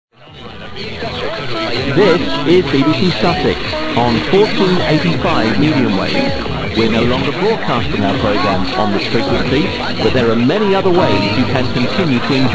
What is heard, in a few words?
1485 BBC Sussex LOOP; sdr Amberg